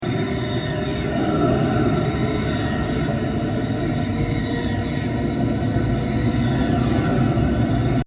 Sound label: Scary Alien Atmosphere With Sirens 120bpm